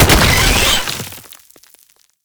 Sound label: Gestating Tumors Spawn; Gameplay; Warframe; Nineteeen Ninety Nine; Deep Archimedia